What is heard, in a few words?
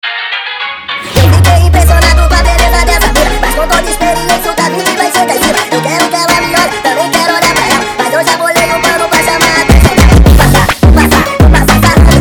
FUNK Sped Up